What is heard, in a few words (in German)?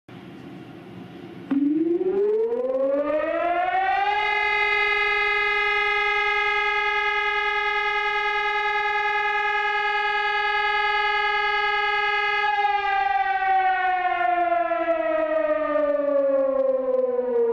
sirenensignal feueralarm